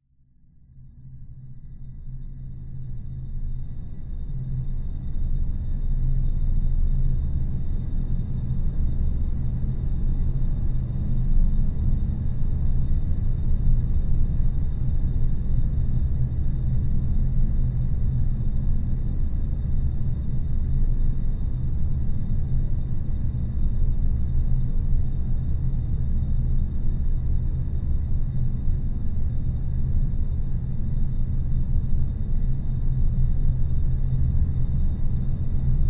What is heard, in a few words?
sound design drone subtle room tone desolate nighttime dark